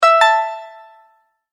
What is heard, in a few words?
multimedia tone verified; Tones; Sound Design